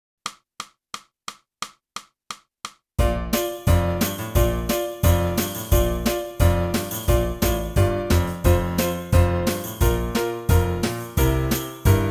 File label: clarinet; boosey method